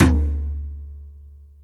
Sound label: perc, Percussion; Trance Explosion Vol1